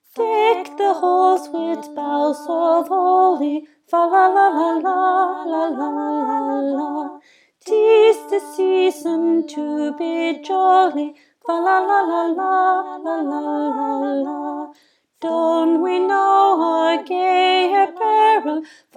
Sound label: Soprano; Deck the halls